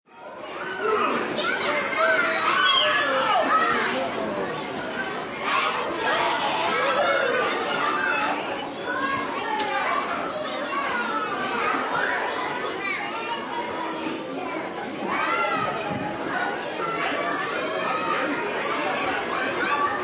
Ambience Party, Party, playroom, 20-30 children, indoor, large room, lots of laughing, screaming; Party Sound Effects; lots of laughing, screaming, yelling and chatter, human voice, kids playing, birthday; VoiceBox; Twistedtracks